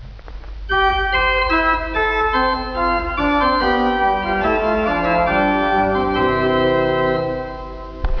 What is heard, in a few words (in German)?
Tutti; Vitus